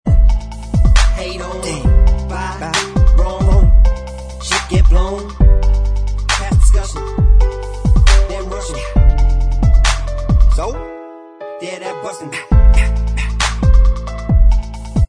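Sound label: backing vocals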